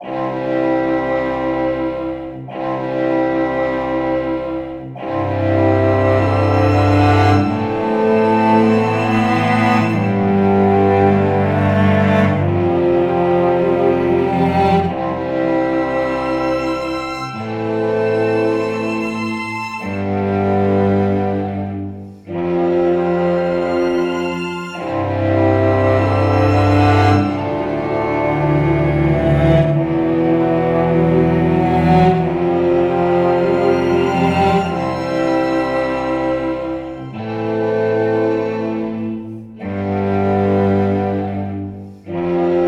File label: Red Leaves Underscore, Autumn Strings; Narrative Mind